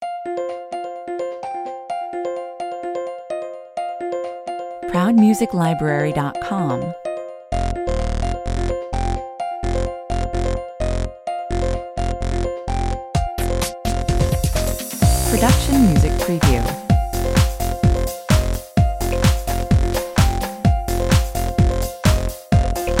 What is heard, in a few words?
electric jazz 128 bpm